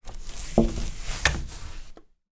household net curtain movmement hand window

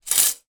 household plastic cutlery many down on surface; zapsplat

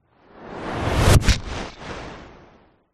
sound design whoosh fast dark thud impact

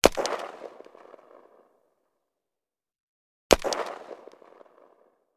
weapon gun ar 15; still north media

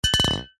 aerosol cans x2 deodorant bash together; zapsplat

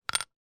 office chalk stick put down on table

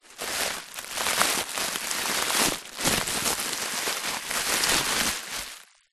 office bubble wrap sheet movements

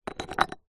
broken piece concrete block movement; zapsplat

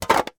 household metal tin lid put on tin

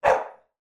dog labrador single bark internal

